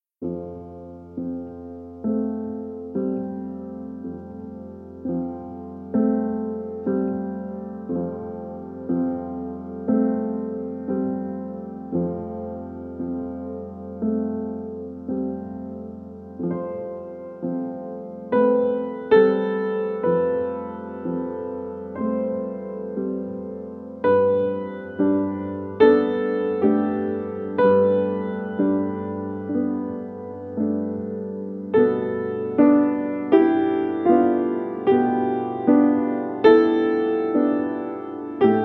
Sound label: Piano